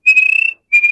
Bokmakierie

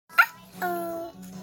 Uh Oh

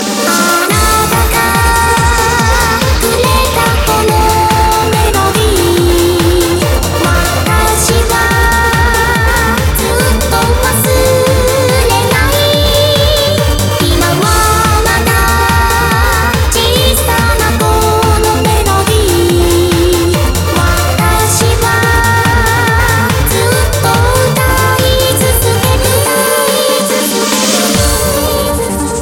Melody

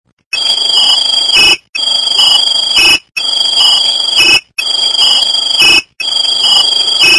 Scott Air Pack

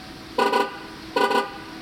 Volt alert

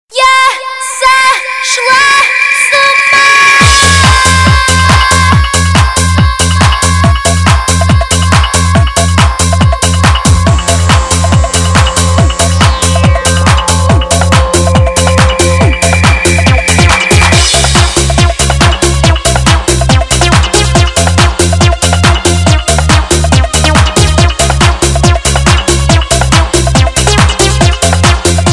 Rock

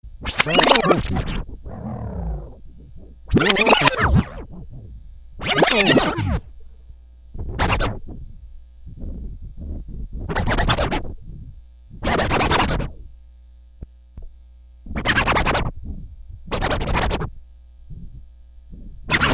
Record Scratches

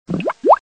Bubble Sms

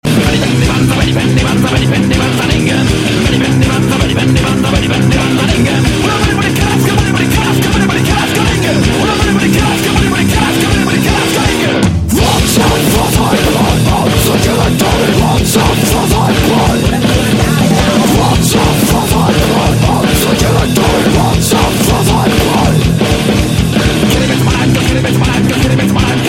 Rock; Deathnote

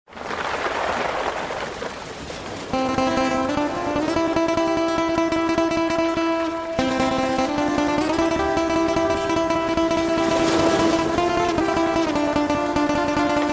Remix Guitar

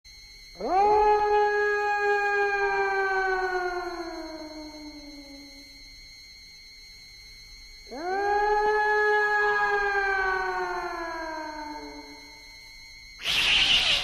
Tuba Tube; Amitabh

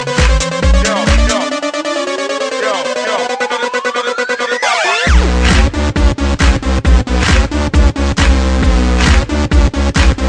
Bassline

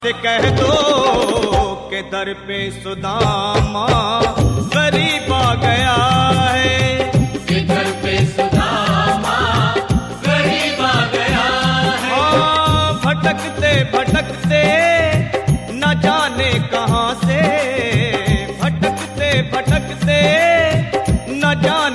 Krishan Bhajan

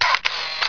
Camera Click

